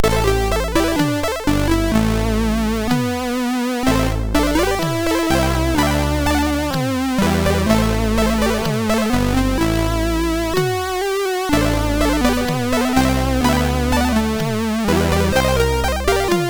Short Tune sid MOS6581R3

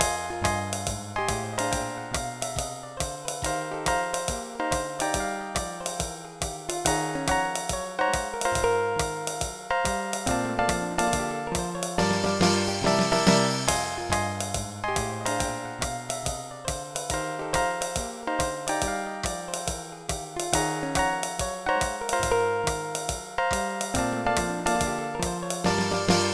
solo; Dr. T's MIDI Recording Studio

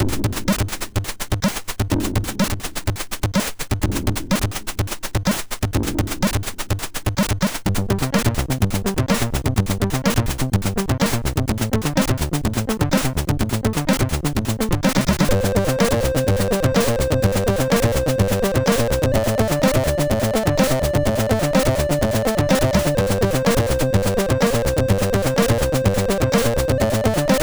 sid MOS6581R2; Demonstration

